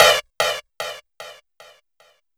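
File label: rim processed, rims, fresh disco house; USB Soundscan vol.20; AKAI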